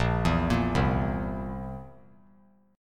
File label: Bb Suspended 2nd Flat 5th Bb down; arpeggiated